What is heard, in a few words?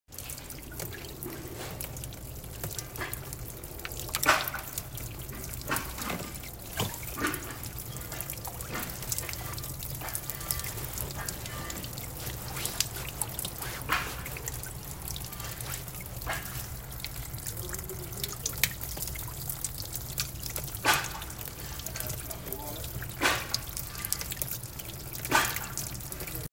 icy leak